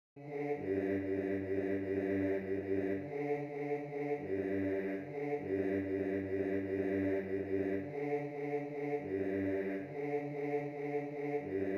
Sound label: Bass II; Mir lebfrischn Hirtn